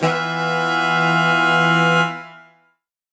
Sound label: Horn Swell Alt Emin9; undercover samples